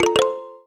Notification

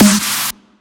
Punchy Snare Evolution

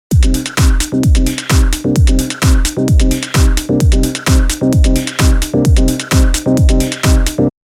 Dance Loop

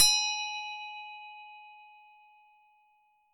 Prayer Bell

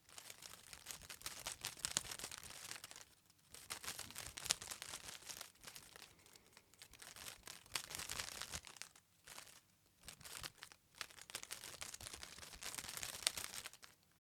Flapping Leaves

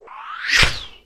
Strong Falling Hit

